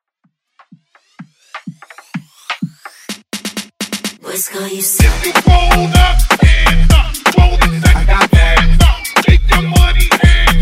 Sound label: Afro Hype